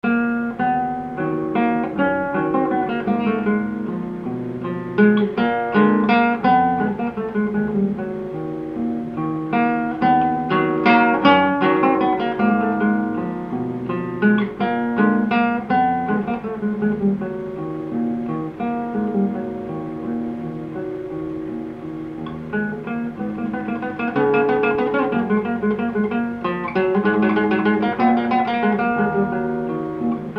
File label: Small Waltz